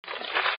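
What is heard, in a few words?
Crumple Paper Into Ball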